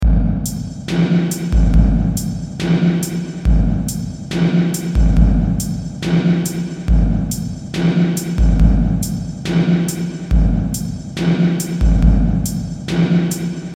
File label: superconductive plate; ir samples